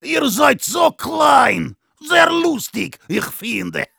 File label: Heavy yell, de